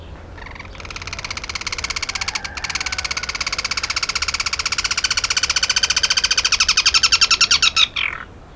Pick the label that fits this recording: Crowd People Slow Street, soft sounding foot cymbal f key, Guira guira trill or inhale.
Guira guira trill